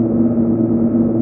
motor, Train; OpenBVE

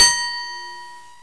Ding; OpenBVE

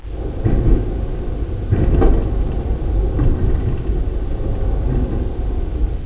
Point, Train; OpenBVE

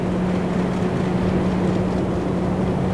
idle, Train; Y7100; OpenBVE; bigaré

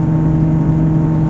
motor, Train cl158; OpenBVE

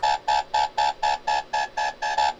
Vigilance, Train; OpenBVE

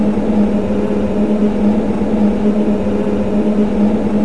motor, Train; OpenBVE

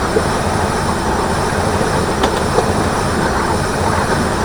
Run, Train; OpenBVE

